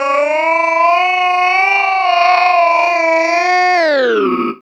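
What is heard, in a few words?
vocal, Drum & Bass, 140 BPM; Ueberschall; Drum & Bass Flash Trackz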